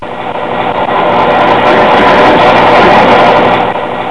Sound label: ghost